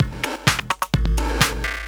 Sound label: VFX2 Loop 128 BPM